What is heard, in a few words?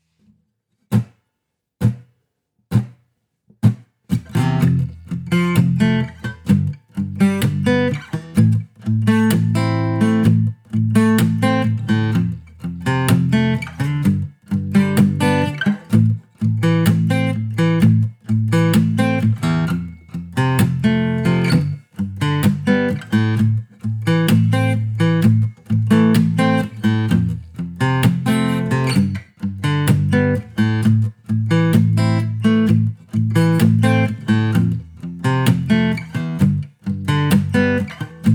backing track